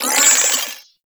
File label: Flare Impact; Warframe; Sentients; Sentient Trooper